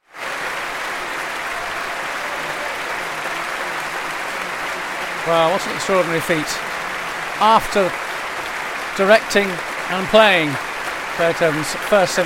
violin concerto no 1 in G minor; max bruch

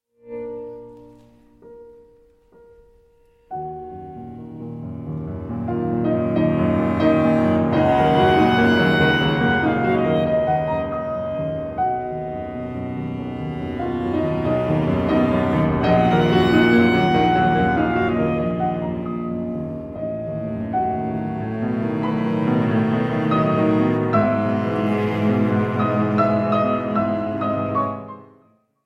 trio in B flat major for clarinet cello and piano; Ludwig van Beethoven

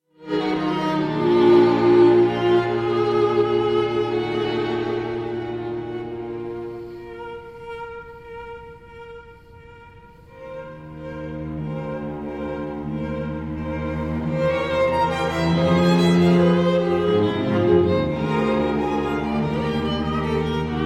string quartet in b flat major; Escher Quartet; op 76 no 4; Sunrise

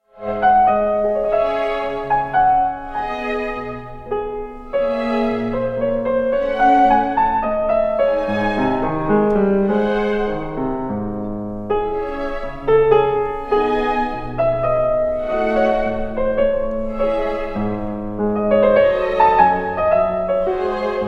concerto for keyboard and string orchestra; bwv 1053; 2nd mvt siciliano

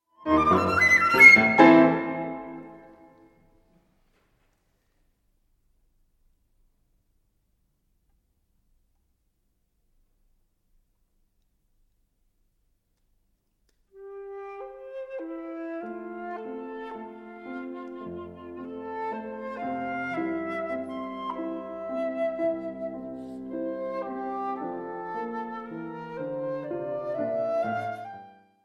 flute sonata in D major op 94; sergei sergeyevich prokofiev

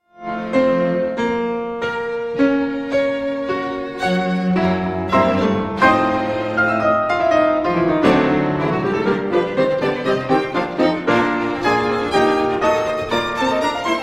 quintet in e flat major op 44 for piano and; robert schumann; emanuel ax; cleveland quartet

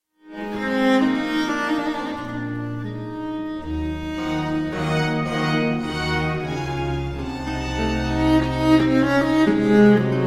concerto con violincello obligato; piatti

